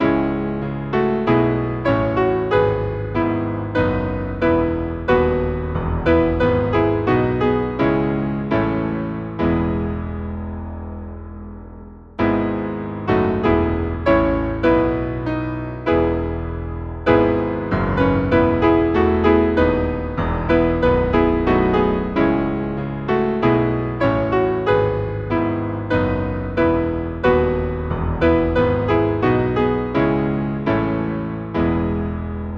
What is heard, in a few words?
Hymn, piano; Love came down at Christmas; Gartan